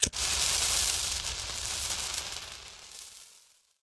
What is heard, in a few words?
Tunnel Blaster Underlay Play